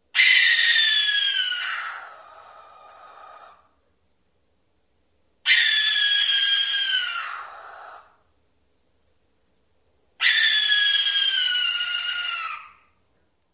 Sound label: screams